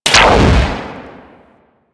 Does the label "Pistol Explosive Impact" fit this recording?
Yes